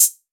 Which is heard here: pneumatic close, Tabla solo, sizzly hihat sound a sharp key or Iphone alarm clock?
sizzly hihat sound a sharp key